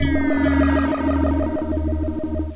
teleporter; opensurge

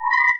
Frog; gnustep

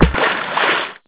water

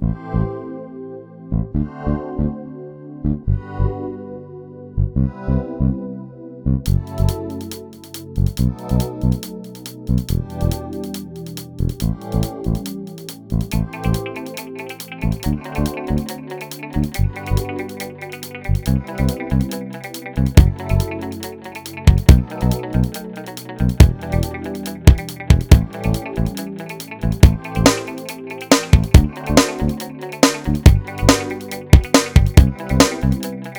menu; opensurge

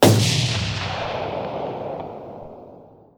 discharge; opensurge

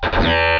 no coins; mazeofgalious

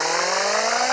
crococopter swoop up; opensurge

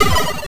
stone hit; mazeofgalious; jorito

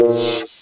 thunder shield; opensurge

